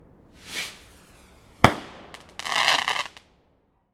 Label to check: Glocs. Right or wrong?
Wrong